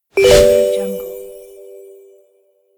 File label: winning